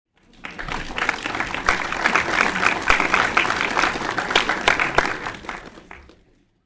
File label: Applause Sound Effect